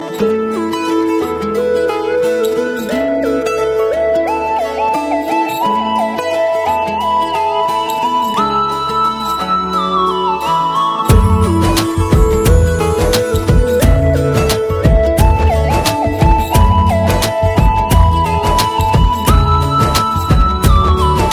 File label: romantic feeling